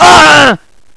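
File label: explosion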